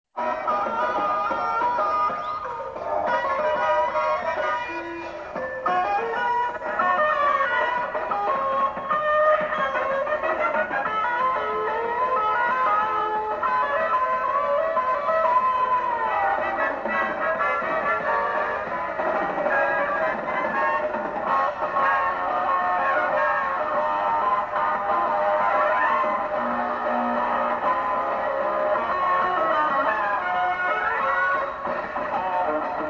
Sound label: circus guitar